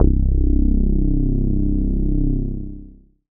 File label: bass C, Sub Bass, Dance Synths; ProSamples vol.37